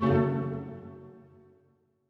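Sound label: orchestra; Masterbits - Switch FX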